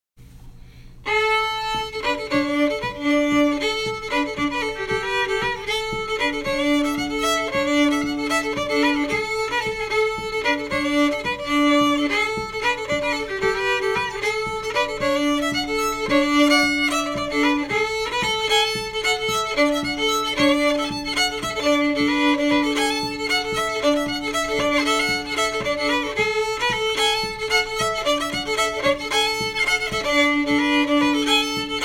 angeline fast

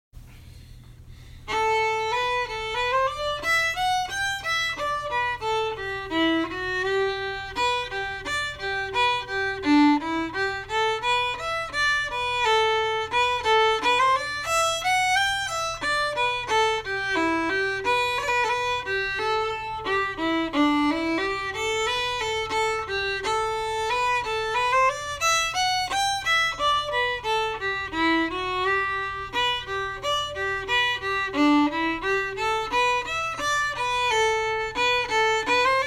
sligo maid slow

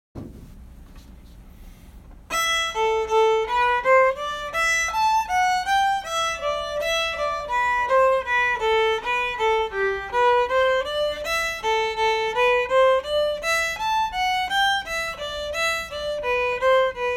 sliabh russell slow

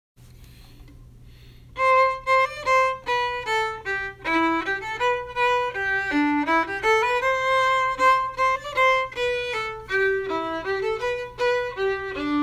Am An Dro harmony

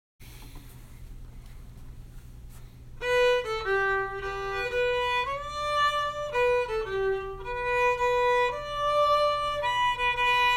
waltz; tombigbee

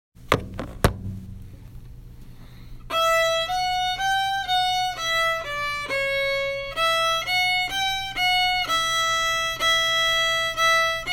old joe clark slow

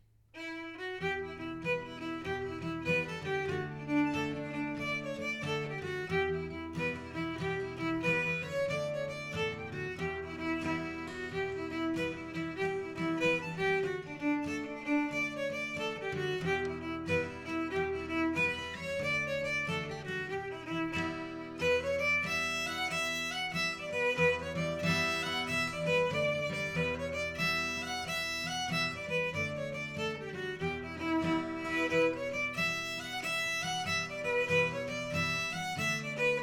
swallowtail jig

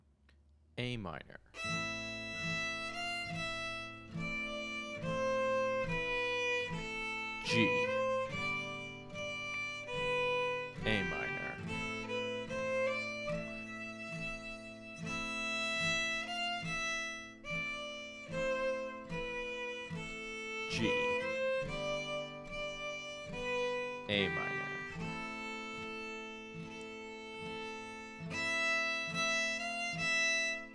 an dro slower chord cues

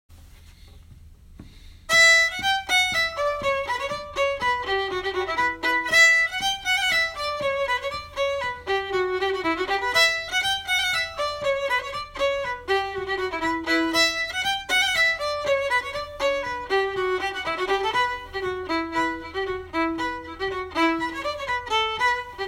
bouree

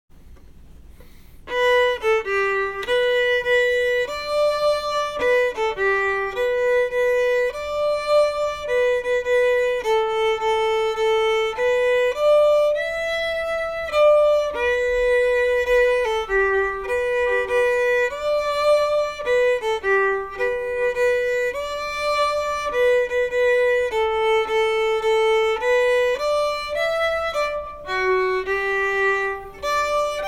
waltz; tombigbee

